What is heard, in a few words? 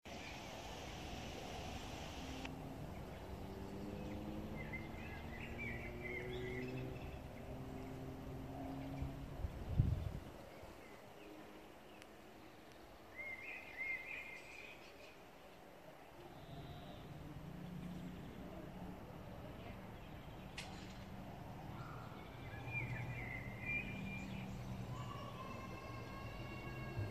sounds of Melrose Abbey